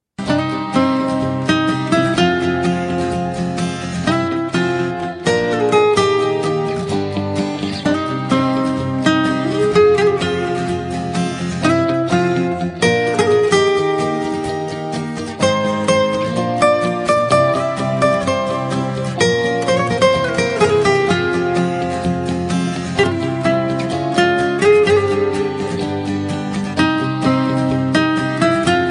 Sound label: Nokia E7 Gitar